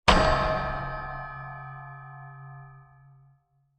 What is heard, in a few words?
Long Metal Impact; Blasts; Impacts; CraftedSignals